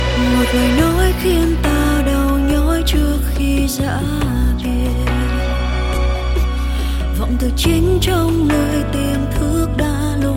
Nhac Chuong

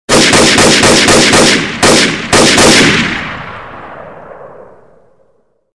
tieng ban sung luc bac; game cs

